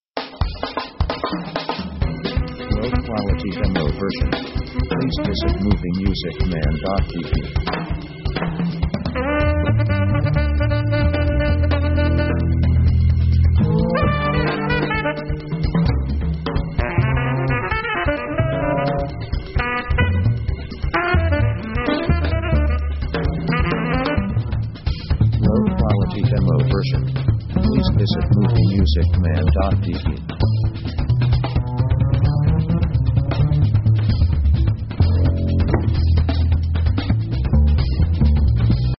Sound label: pop 130bpm jazzy action